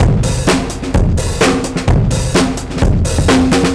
Jazzy Drums 128; musicradar

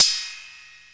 RIDE, CYMBALS

